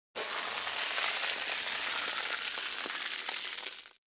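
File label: Sandbag Dragged Across, sand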